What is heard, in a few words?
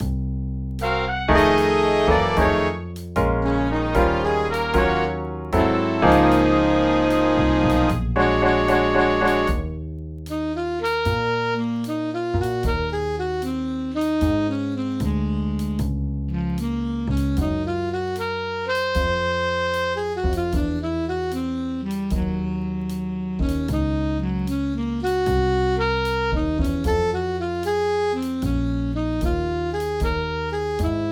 Alto Sax solo; Combo Charts